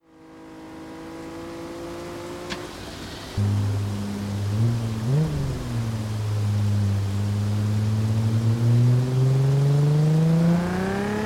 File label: nissan 350z nismo s-tune; TDU-1